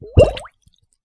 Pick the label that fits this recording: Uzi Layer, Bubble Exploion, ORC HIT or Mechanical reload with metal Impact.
Bubble Exploion